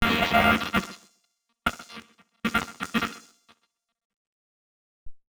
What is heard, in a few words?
Battered Synth; malfunctioning synth samples